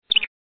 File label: mice